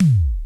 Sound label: E TOM L, DRUMS; Crypton - DrumBase5000